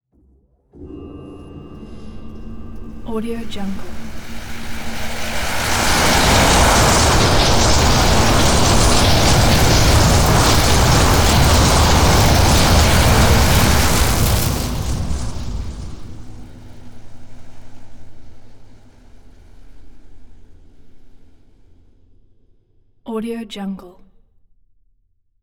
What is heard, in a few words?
energy neutrons flow in pipe; soundmorph